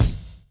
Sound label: drum chop; AMG Gota Yashiki Groove Activator